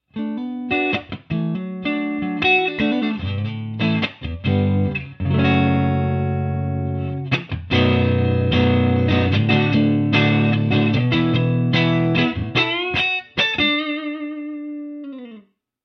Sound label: clean